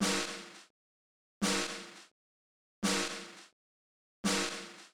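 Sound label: SN tran wet, snare drum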